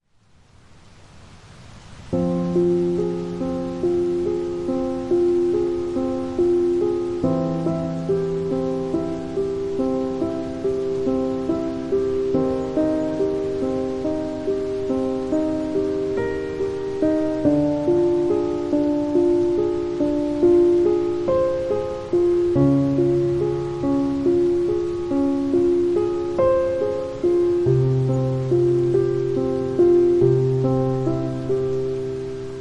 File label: Piano Waltz (With Rain), Calming Piano Music with Rain